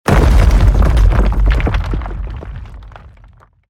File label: smash; tungtung phase2